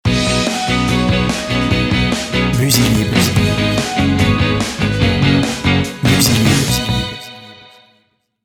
hypop, jingles

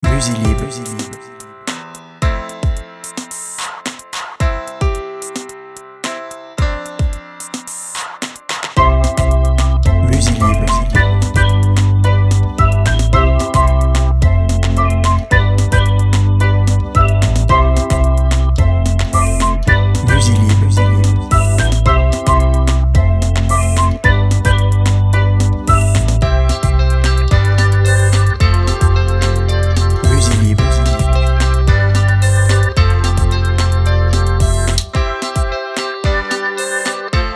KIDS

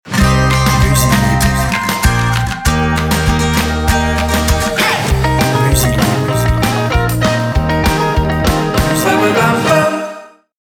indie, jingles

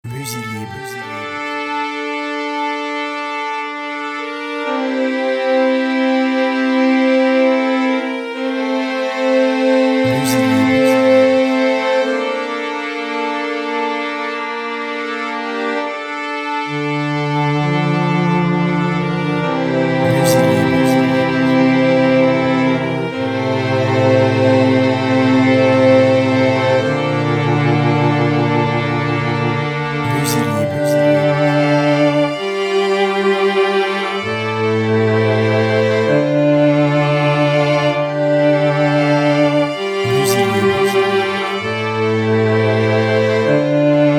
luxury

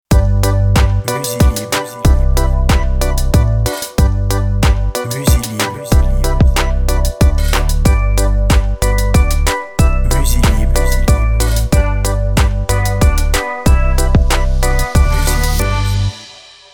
kawai, jingles

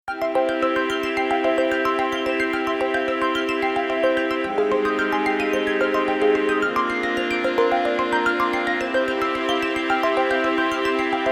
deep sea

